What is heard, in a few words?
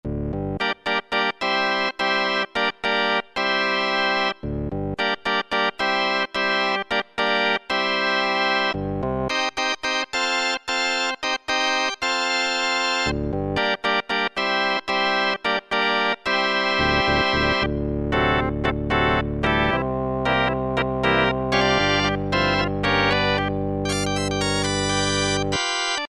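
Electric Organ, Roland D-110; Factory Patches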